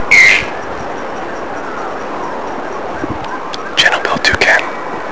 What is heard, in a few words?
Toucan, Channel-billed, Birds, Brazil; Brazil 2005